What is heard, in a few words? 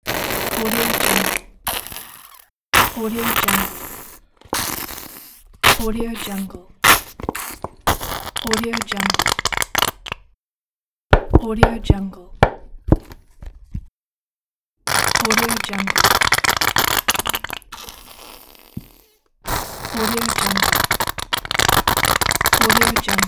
squeeze out ketchup or sauce; teldrassil